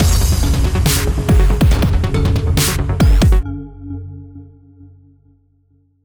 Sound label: Industrial Action mood